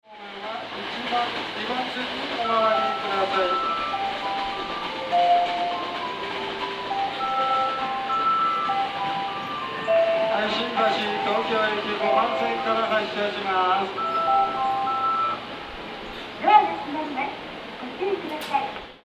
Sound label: shinagawa melody; jreast; tokaido